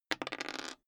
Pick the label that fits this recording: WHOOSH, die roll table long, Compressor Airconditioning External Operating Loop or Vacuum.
die roll table long